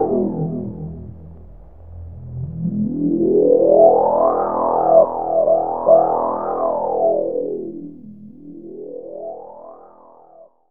DR. LOOP, TRANCE; X-Static Goldmine 3

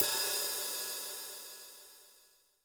HiHat & Crash, Roland TD-20; HiHats

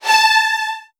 A3, VN 16TH, Virtuoso Strings; ILIO; Kirk Hunter

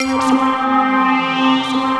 ATMO SYNTH

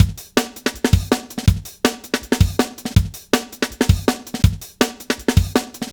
162, breaks; M8

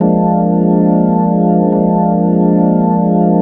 Organ, Loops; Trip-Hop

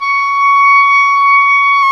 WND OBOE, Oboe Room; Roland L-CDX-03 Disk 1; Oboe 4-8

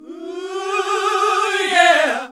HAL GOSP. MIXED; Sound & Vision - Gigapack II CD 1 (Roland)

